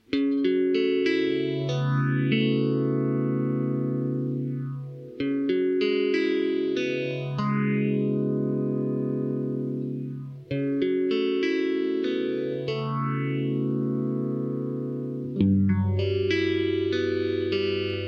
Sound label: strat neck slow; coron phaser55